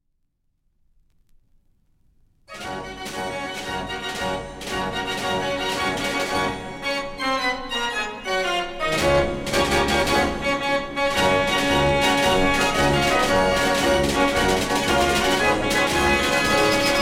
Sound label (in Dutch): draaiorgel; amsterdam